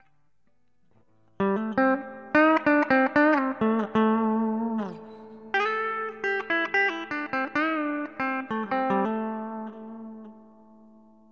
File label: epiphone, guitars